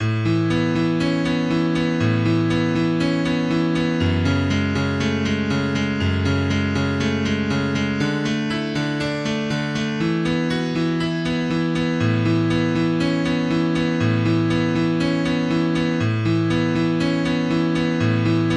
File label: Chorus arpeggio